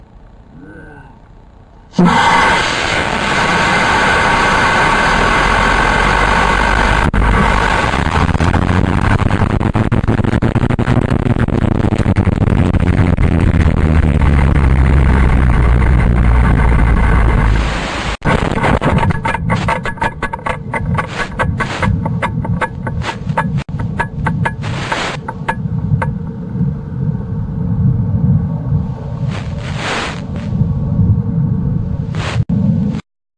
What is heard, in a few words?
Flight half speed